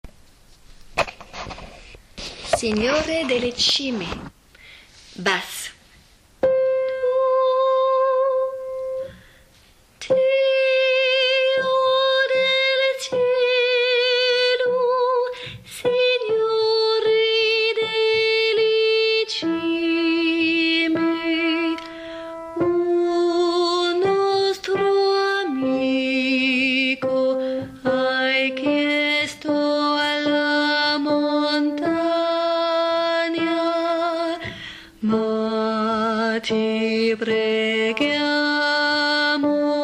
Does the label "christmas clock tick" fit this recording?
No